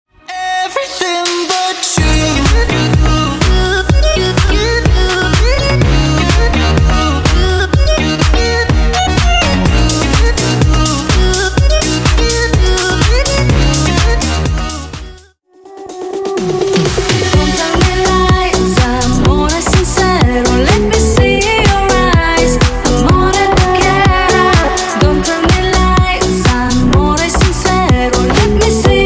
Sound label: Party Dance House 125 Bpm